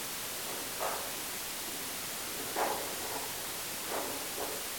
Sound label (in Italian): Rumori; Bogoni